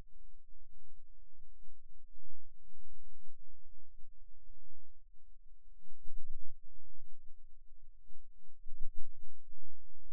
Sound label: Rhythm Wave, eeg seizures